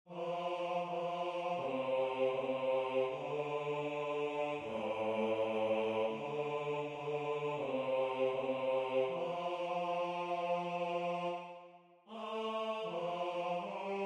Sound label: Bass